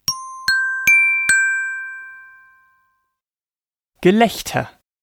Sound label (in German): Gelaechter